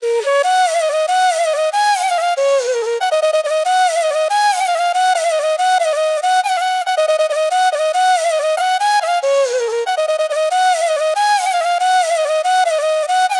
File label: Melody Loop BPM 140 G Min; Gully Loops; Mahi